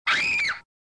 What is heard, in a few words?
scream